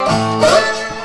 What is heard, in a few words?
dobro danger